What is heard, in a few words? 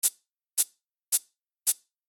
stereo perc